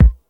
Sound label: nineties phat bass drum one shot a sharp key